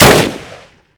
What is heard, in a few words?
Bren Shoot; Minecraft; Infamy; Last Alive Pack